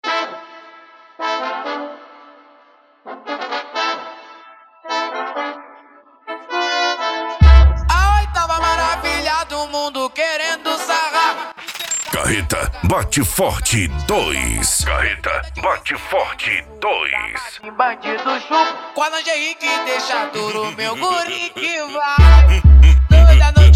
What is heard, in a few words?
midback e funk mega bass